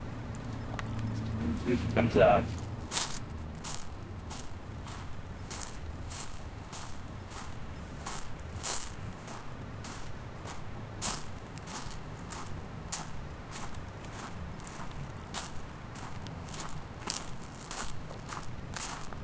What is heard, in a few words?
walking on sand; beach